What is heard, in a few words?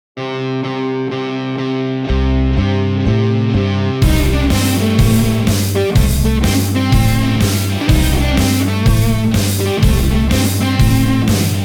electric guitar - drums